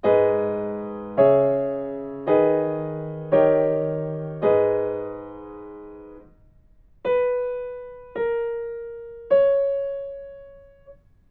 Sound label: melodic fragments; ear training